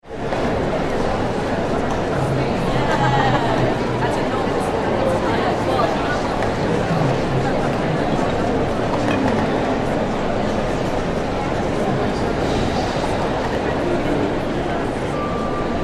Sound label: Exhibition Huge Crowd Atmosphere 2; Exhibition Huge Crowd Atmosphere 1 - Olympic size venue; Crowd Sound Effects